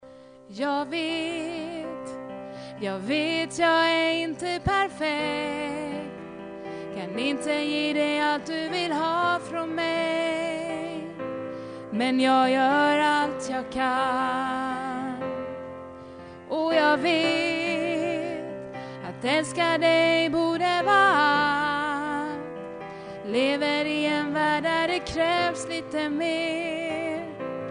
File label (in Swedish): sopran